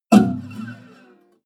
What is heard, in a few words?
bottleneck strings slide down damped